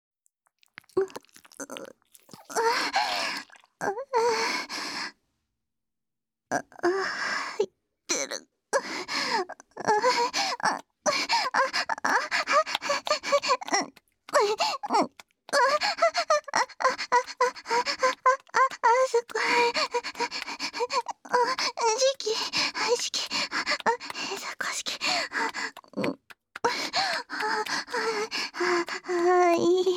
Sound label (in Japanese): 挿入 喘ぎ; SEあり